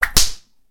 whipping; jidaigeki

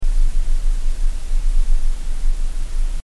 base noise

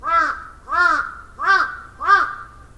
crow

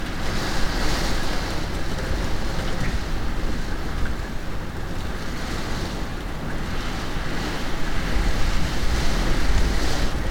storm hit window; nature

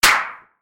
cracker

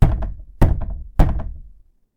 knock on Fusuma; knocking

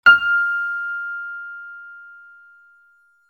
piano F; instrument

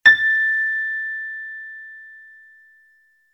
piano A; instrument

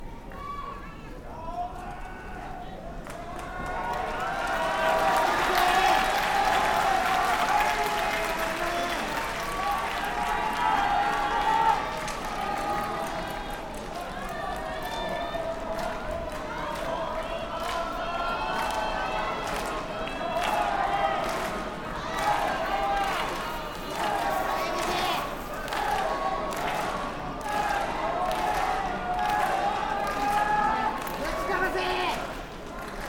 Ozeki fighting

